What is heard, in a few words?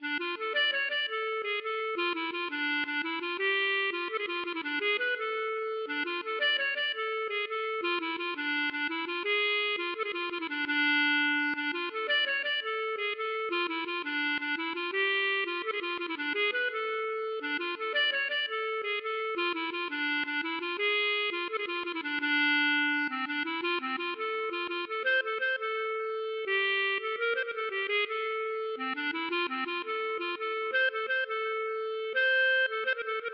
klezmer